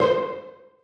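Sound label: celli pizzicato c5; Sonatina Symphonic Orchestra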